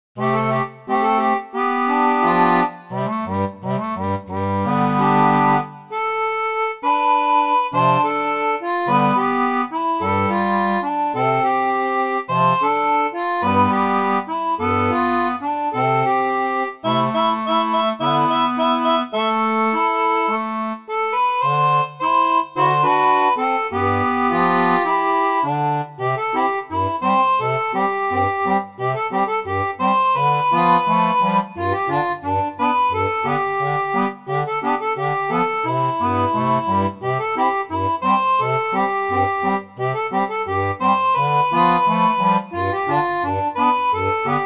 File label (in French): seraphone, orgue barbarie